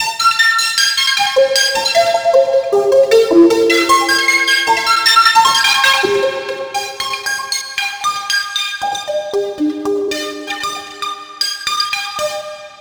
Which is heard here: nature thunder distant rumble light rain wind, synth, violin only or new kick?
synth